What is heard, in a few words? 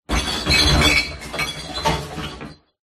household glass tip into recycle bin; scott lawlor